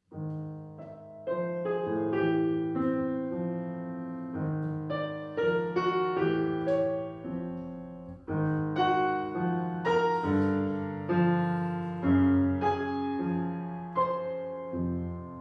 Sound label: Piano Questions